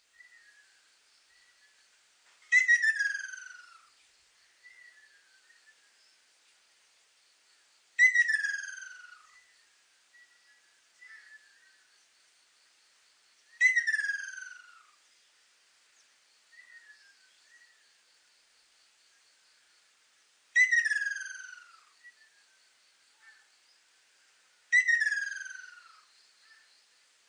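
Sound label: Ryukyu ruddy kingfisher; ryuukyuuakashoubin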